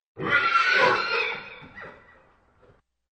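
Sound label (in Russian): ржание в деннике подальше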